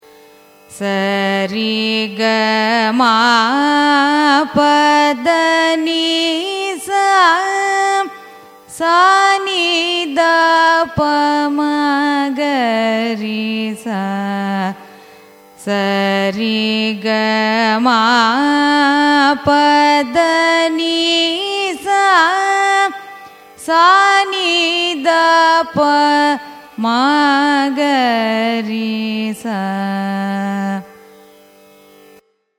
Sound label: raga hemavati arohanam avarohanam, carnatic music